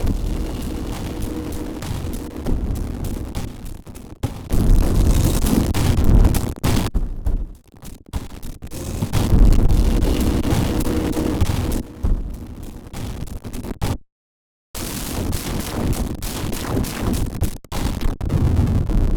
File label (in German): Stepsequenzer xtreme Samplereduction